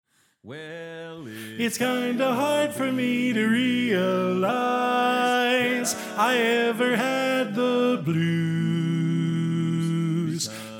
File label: Lead